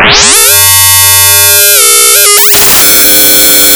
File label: ET NOISE; Big Beat Samples & More; AKAI